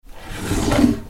Kitchen Drawer Open

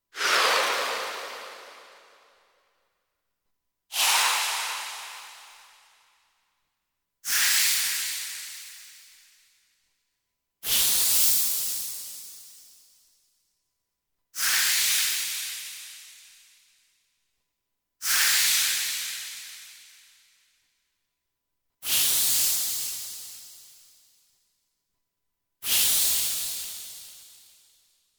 Steam Sweep

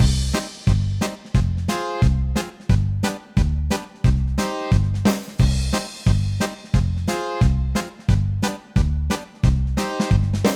Coin Op Strangeness, chiptunes

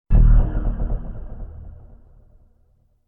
Creepy

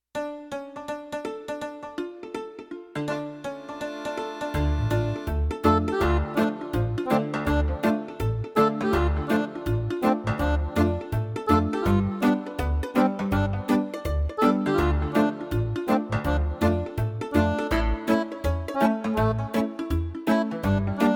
Quirky Western Town, funny

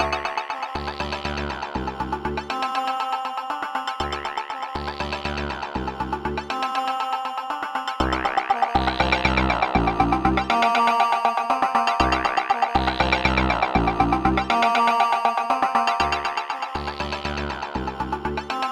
Space Chickens From the 70s, funny

